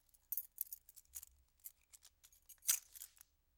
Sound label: Keys Into Ignition; Fairlight Sound Lib